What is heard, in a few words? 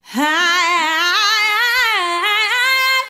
voice haiyaiyaiyaiyai; Phonky